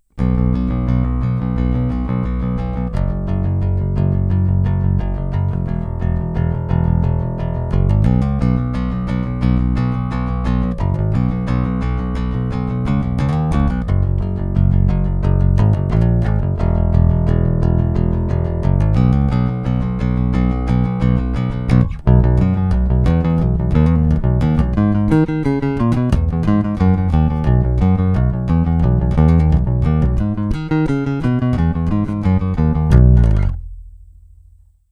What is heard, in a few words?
Shortscale J Punk Mix, basses